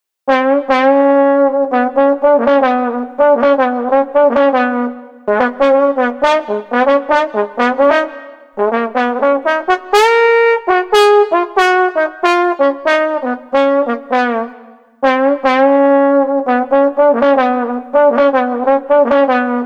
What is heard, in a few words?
Street Trombone Upbeat 120